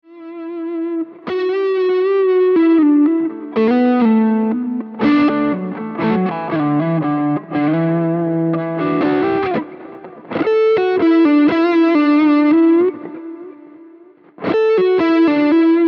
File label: Fat Strat